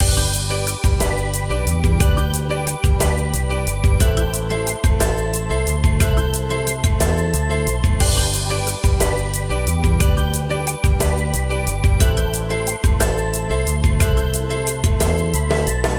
Beat Theme Loop

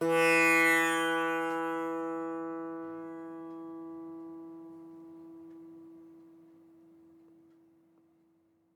Tambura

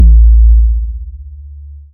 Kick

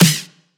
Electro Flanged Snare

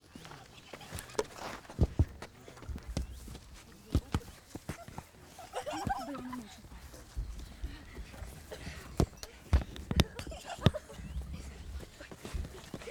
Kids Play Football

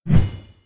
Large Sword Swing

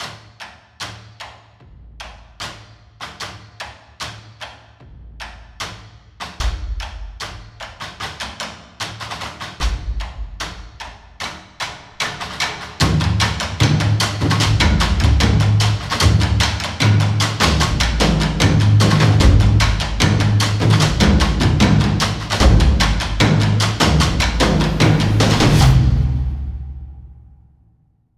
Cinematic Percussion Trailer Percussion Only

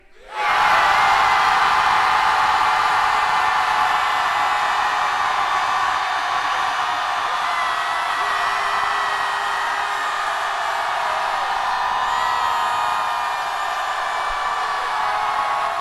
Cry Of Great Public

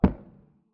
Giant Step

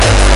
Hardcore Bass

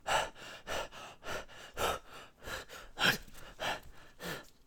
Guy Run and breath

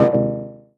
Error

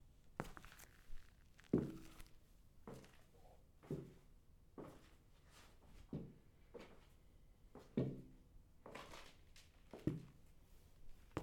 Man Walking With Cane

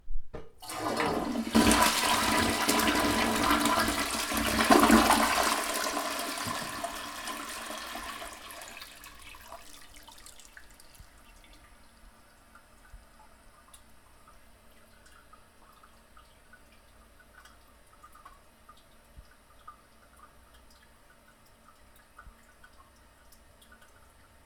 The WC Sound

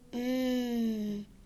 Mmm Girl

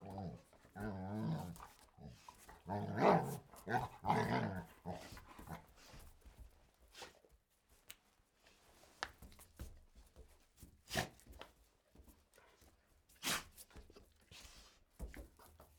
Barking Dog

